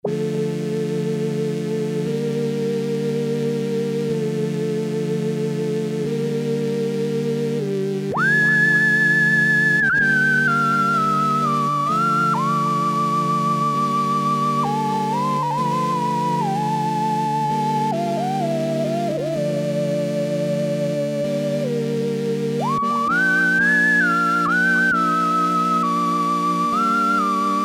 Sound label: Poli Viola + Mono Piccolo, Farfisa Syntorchestra